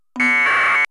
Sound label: afsk